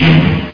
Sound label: shoot; Macross